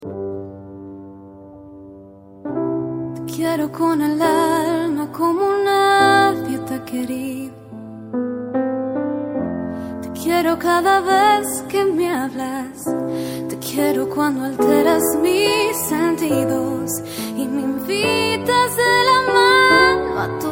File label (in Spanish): Musica de fondo